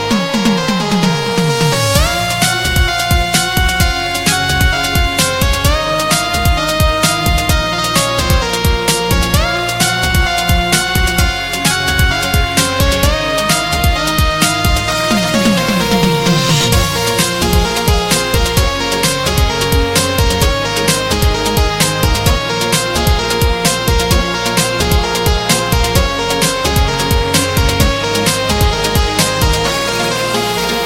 Snake Ringtone

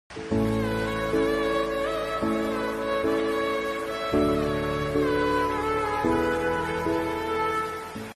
Instrumental BGM Ringtone

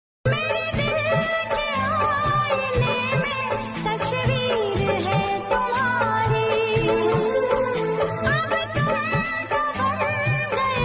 Old Bollywood Song Ringtone

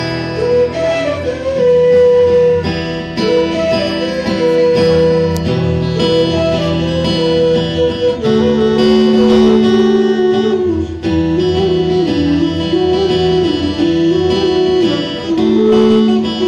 Flute

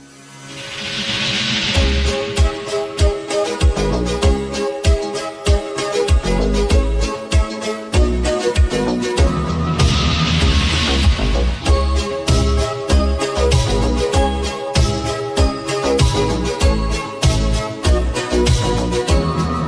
Slowed Ringtone

